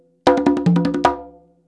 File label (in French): frise, congas